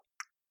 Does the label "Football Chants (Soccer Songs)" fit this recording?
No